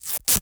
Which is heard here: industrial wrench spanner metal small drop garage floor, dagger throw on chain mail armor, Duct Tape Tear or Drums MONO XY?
Duct Tape Tear